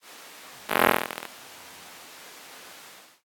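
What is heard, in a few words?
noise crackle, Signal Communication; Bluezone Corporation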